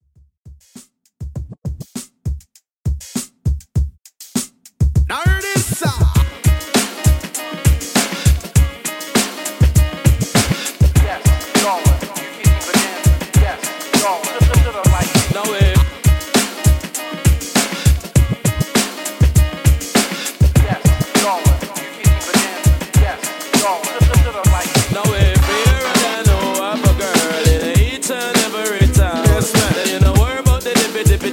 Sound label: 100 Bpm; 90s Redrum